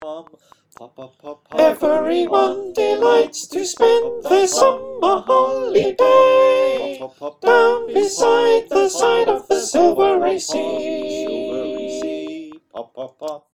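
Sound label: soprano, choir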